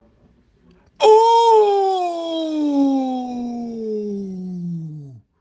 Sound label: ooooooowwww